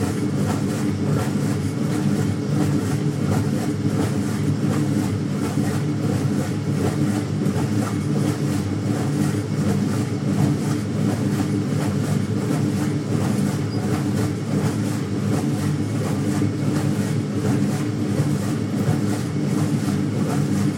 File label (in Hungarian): monoton gep